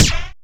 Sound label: Snare, dry snares; Quasimidi Rave-o-lution 309